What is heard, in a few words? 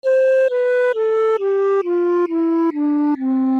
Descending Scale